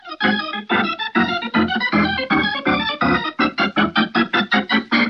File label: Organ Crescendo